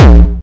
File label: hardcore verb, Kicks; single hits